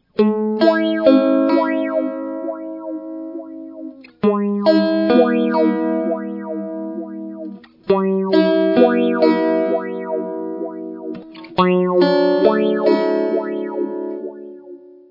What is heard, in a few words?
phaser; feedback